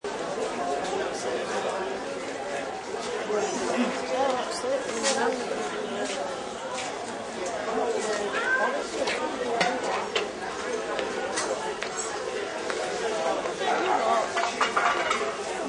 Clothes Factory Outlet, Outdoor crowd. Moderate pedestrian str; Clothes factory outlet. Outdoor crowd. Moderate pedestrian street traffic. People shopping and talking; Stores And Mall Sound Effects; Lynne Music